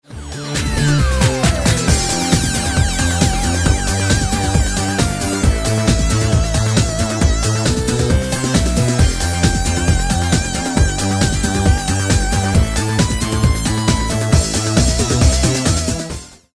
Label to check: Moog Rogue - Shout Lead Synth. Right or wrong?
Right